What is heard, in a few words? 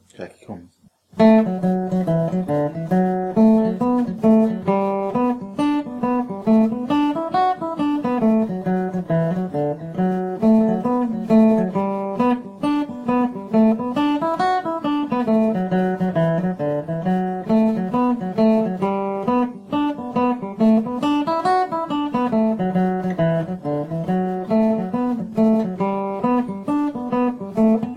Eddie medium guitar; Jackie Colemans